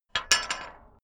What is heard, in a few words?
metal gate bolt